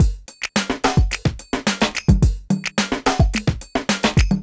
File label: PHATT BEA